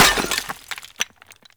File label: Destroy Planter Hanging; Warframe; Ambience; NineteenNinetyNine